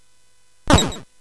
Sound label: Dark Castle, CSG8580R5